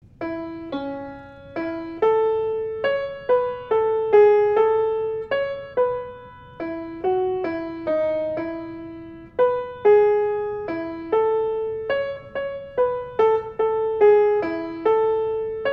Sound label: St Matthew MELODY